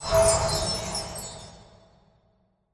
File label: Line Win; genesis; clash Of Queens